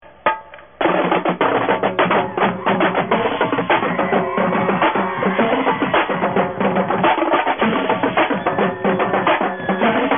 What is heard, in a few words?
noise; Pitt Drumline Warmups